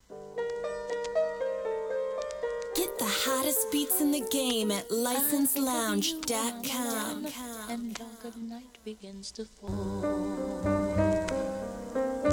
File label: 93 BPM